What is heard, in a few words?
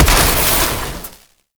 core electrical explosion; Warframe; Corpus Gas City; Gameplay Remaster; Ambience